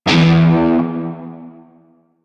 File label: Shawzin Oct One E; Music; Warframe; Narmer Shawzin